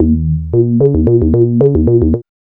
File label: B-LOOP